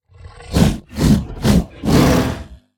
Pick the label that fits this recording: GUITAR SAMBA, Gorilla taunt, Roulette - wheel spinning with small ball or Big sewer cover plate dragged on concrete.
Gorilla taunt